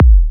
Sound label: Kick Minimal